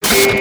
medi shield burn, weapons; tf2

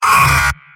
medic pain sharp, mvm; tf2; garrysmod

